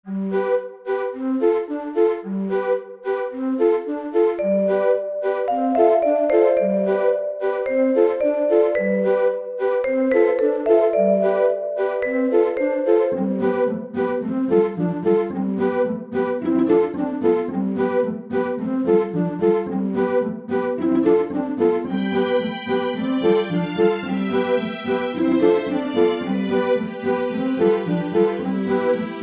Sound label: Happy Game Theme